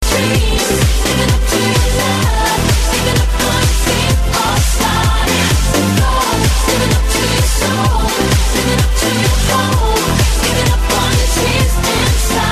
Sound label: Dance Club